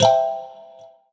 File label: PUZZLE Success Xylophone Three Note Fast Wet; stereo; Universal Sound FX